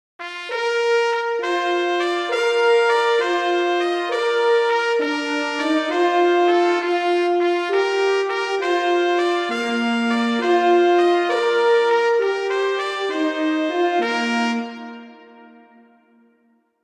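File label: I Saw Three Ships, Christmas flute quartet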